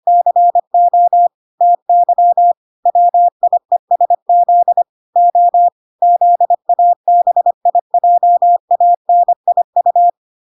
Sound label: 25wpm